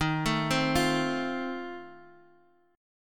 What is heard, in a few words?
F Major, D sharp; arpeggiated; F/D#